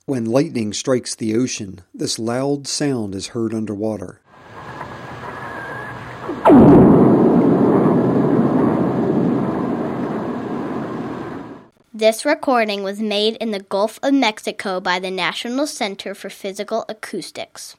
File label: lightning narrated